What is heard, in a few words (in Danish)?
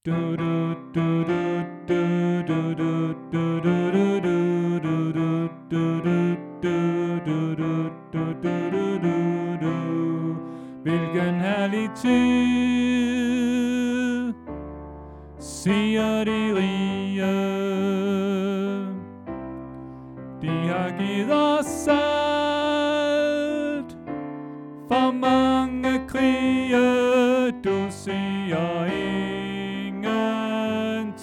Tenor